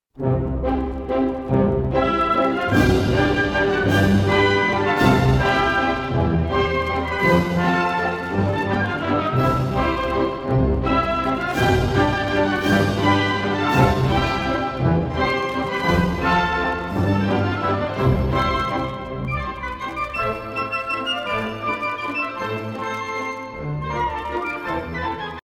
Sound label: Don Quixote Male Variation